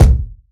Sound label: punchy steel kick drum sample C key